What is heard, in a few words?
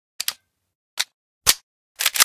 mp5 reload, weapons